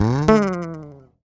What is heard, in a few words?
Bass slide, Rock; ACID MUSIC